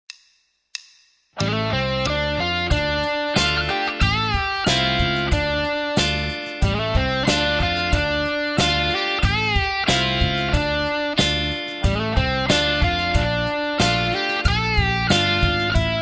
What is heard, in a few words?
Hit the Bass, Rock & Blues Solos; 10 Rock & Blues Solos; De Haske